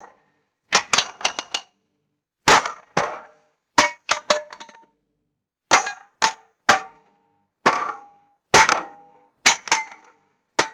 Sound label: sharp metallic impacts on thin cans; bullets